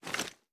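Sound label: pistol 1911 inspect; gmod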